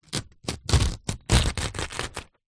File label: notepad small binded page rip tear out; foley; zapsplat